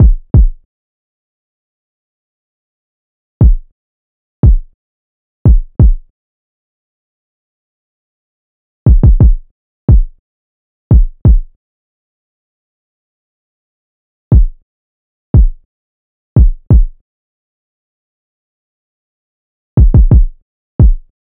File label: Drum Loop - 88 BPM Kick; Drum Loops; Loop Stems; Cymatics; Mystery Pack Vol 4; Patience Drum Loop